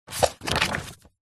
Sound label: household book thick hard back cookery page turn